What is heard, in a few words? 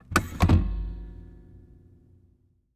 Transportation Seat Full Size Van Seat Front Passenger Seat Adjustments Backwards